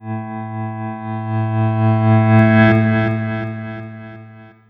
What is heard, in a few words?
Buzzing Pulse